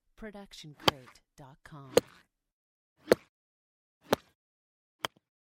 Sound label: golf club sand wedge bunker mono; soundscrate